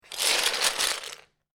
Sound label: Lego, grab several lego pieces; Miscellaneous Toy Sound Effects; LynneMusic